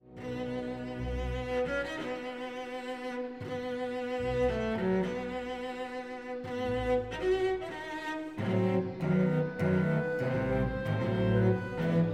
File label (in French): Concerto violoncelle; Dvorack